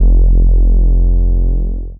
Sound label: deep reese bass one shot in f